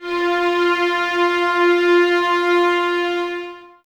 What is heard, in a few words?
VNS F 4, Violins, String Ensembles; Miroslav Vitous